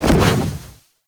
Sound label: Wall Latch Start; Warframe; Tenno